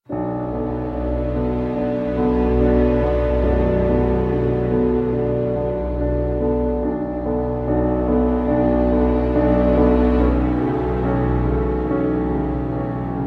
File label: bgm